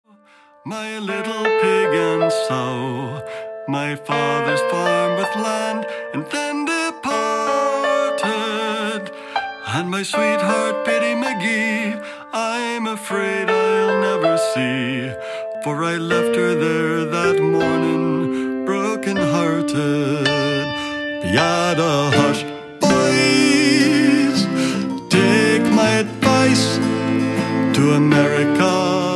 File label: The Emigrant, Irish Band